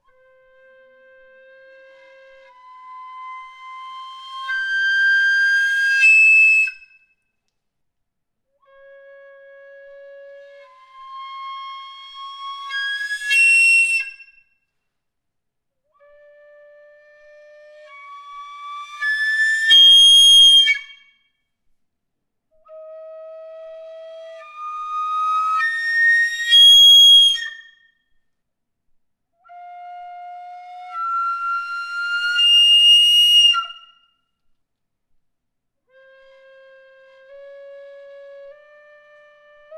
flauta tubular occidente

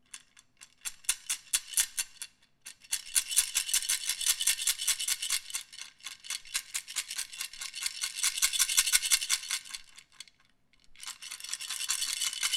maraca en efigie femenina

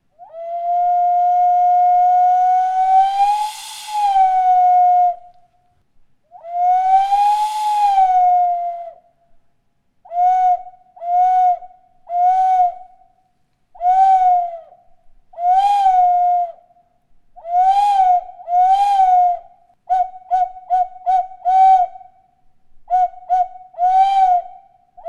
silbato efigie

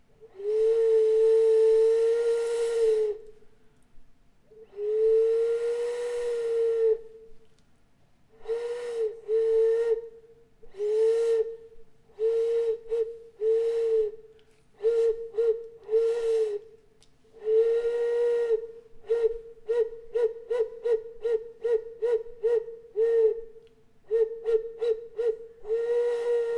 silbato efigie

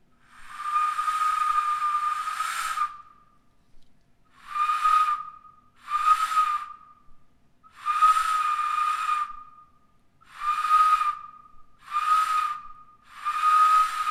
silbato en efigie zoomorfa

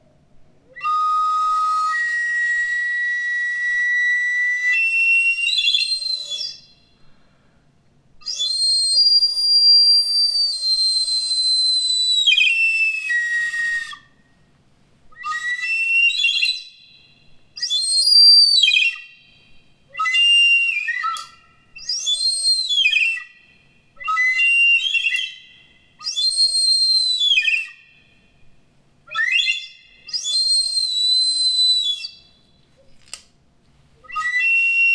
flauta de embolo

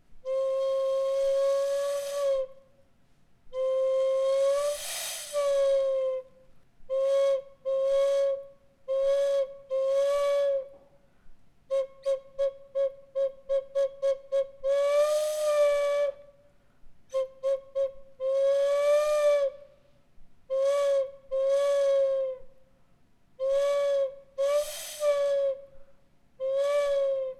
silbato en efigie femenina

